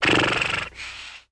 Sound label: Okto Carriage idle; Seer; GamigoNA